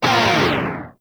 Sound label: Proj Small Fire; Warframe; Lizzie